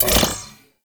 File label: Droid Array Unequip, weapons; Warframe; Cephalon